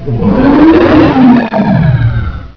pain; quake2; bauul

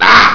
pain; quake2; dung

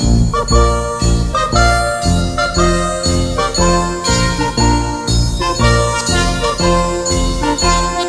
clockwork, tunes; quake2

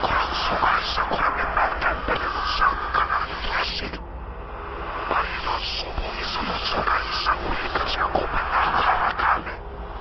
evil sight, ambient; quake2

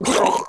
glurp; quake2

